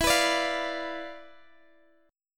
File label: D Minor 6th E, guitar chord; Dm6/E strummed